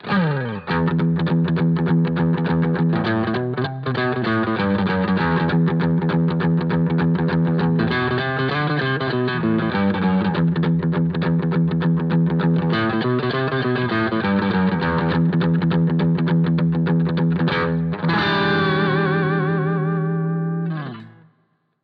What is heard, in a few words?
Cube lt Strat Crunch